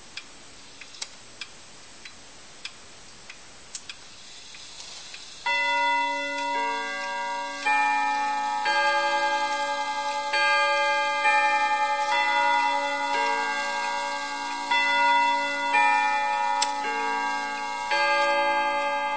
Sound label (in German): Westminsterschlag 45 Minuten